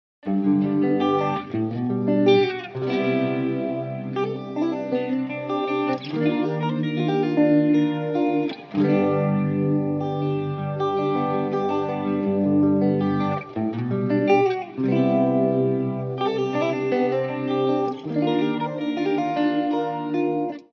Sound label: Phaser Swirling Spectrum Guitar Wet; Chromacycle; Syntheway